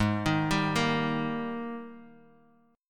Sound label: G sharp Major 7th Suspended 2nd G sharp, down, Guitar, Standard; arpeggiated; chord